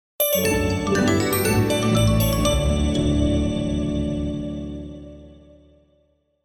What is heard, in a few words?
Fanfare